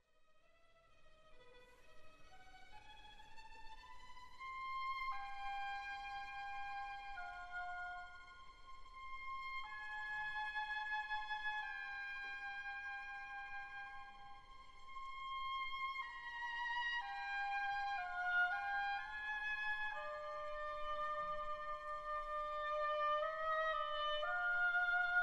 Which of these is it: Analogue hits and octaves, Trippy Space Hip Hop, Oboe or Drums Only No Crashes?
Oboe